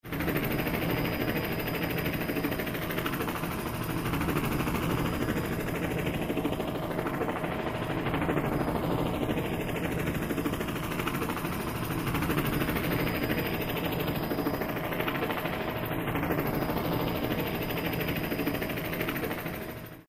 Helicopter Circling speakers

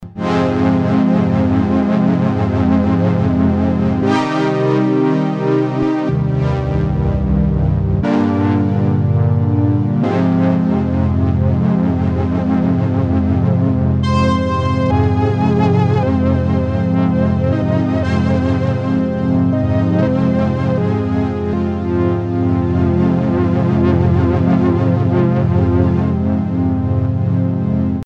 Stringy; Strobe PD

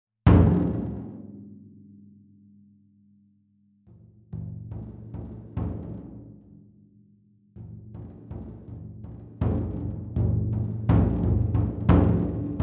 taiko Ohdaiko Stick touch; fxpansion

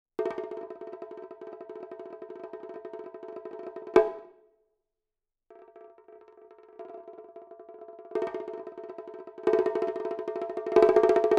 taiko Shimedaiko Roll; fxpansion; Shimedaiko1

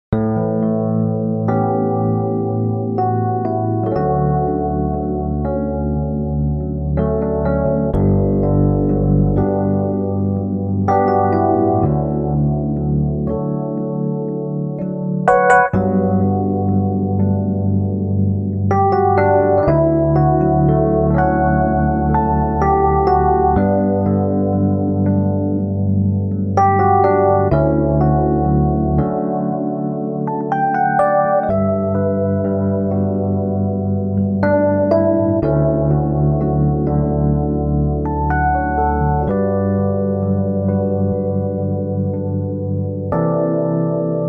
electric 88 piano; waves

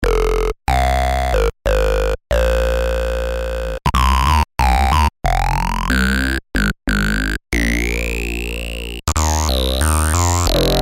Wood Maker; Fusor Preset BA

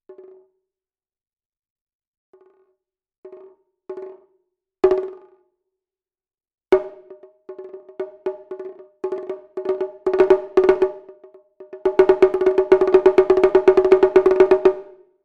taiko Shimedaiko Drag; fxpansion; Shimedaiko2

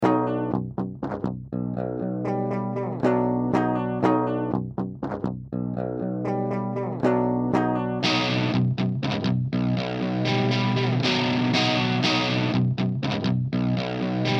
distortion pedal; Maul

